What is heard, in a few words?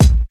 rap kick drum one shot a key; kick drum samples